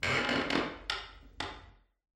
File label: ambient wood board creak